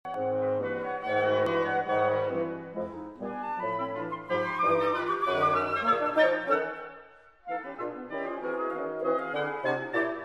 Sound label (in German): blaeserquintett; 3 satz